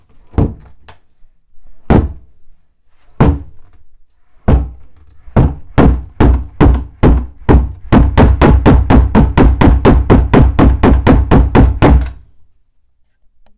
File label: Door Banging